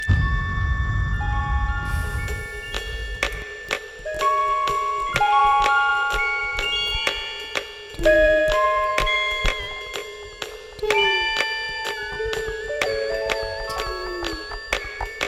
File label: breathing